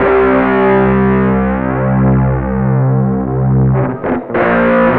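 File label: distortion guitar; USB Soundscan vol.31; Miro s Grooves; AKAI; DISTO GTR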